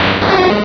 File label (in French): Cri